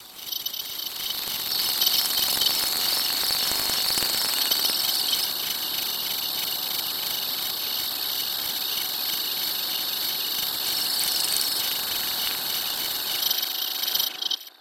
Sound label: insect; from wakefulness to consciousness